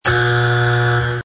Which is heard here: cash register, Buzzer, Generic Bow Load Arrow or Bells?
Buzzer